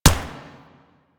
Fire; Warframe; Vipers

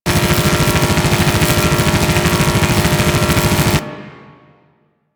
Laser Spin Ability Loop; Warframe; Corpus; Ambulas

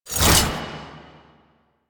Whoosh F, weapons; Warframe; Gunsen Prime

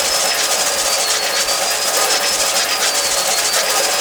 Sticky Bomb Proj Loop, Weapons; Grineer; Veilbreaker

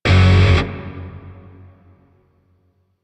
Shawzin Hirajoshi Chord G; Grineer; DE; Warframe

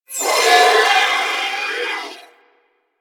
Sentient Death, Barks; Enemies; Symbilyst

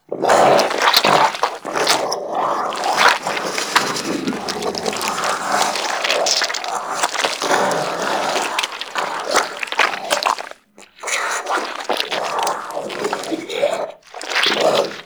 Infested Jetpack Spawn Pod Loop; Warframe

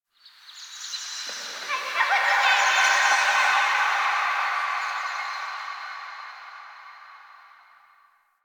Park Memory Echoes; Warframe; Quests; TheNewWar; Ambience; Zariman

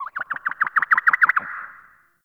Forest Open Glade Bird Far, Day

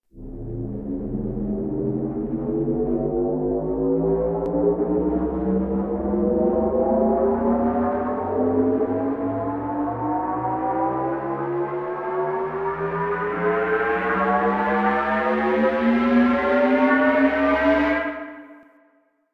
Tower Charging; Warframe; Bard Quest; Orokin Tower

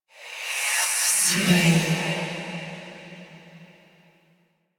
Duviri Hand Compass Vocal; Warframe

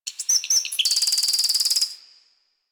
Drifter Camp Bird Close; Ambience; One Offs; Birds; Warframe; Quests; The New War

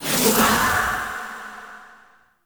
Ward Cast Stone Accent; Powers; Fey; Founders

